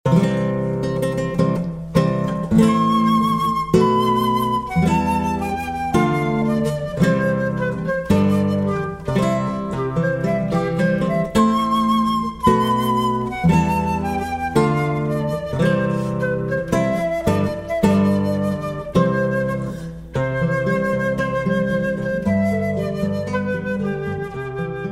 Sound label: Classical Gtr